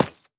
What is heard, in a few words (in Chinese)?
凝灰岩砖放置声